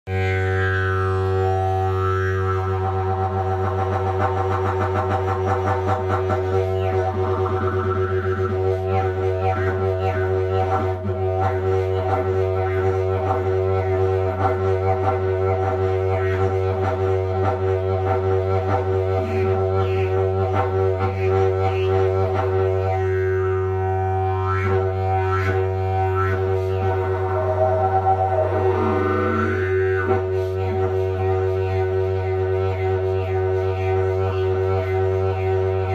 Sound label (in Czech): jilm, didgeridoo; jilm drakobij